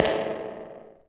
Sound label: tile